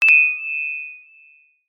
multimedia notification alert prompt bright chime ping; zapsplat